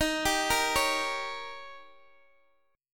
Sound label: D sharp 7th D sharp, Guitar Open D